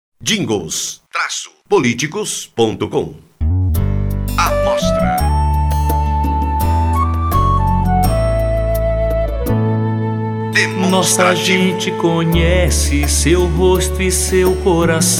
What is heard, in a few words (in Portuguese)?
Jingle politico; SENADOR; Emocao Agito